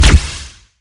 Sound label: shoot, plasma rifle; weapons